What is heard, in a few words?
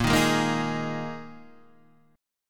Bb 7th Suspended 4th Bb Guitar Standard; strummed; JGuitar